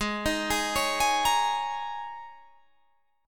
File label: G sharp Suspended 2nd Suspended 4th, G sharp, down, Guitar, Drop D